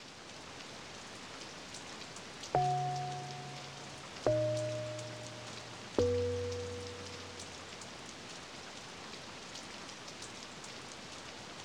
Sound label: Loading rain